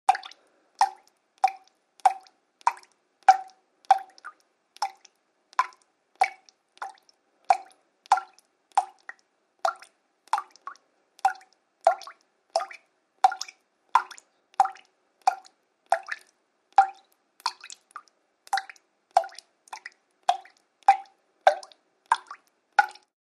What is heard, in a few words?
Water Drip Fst Irregu, drips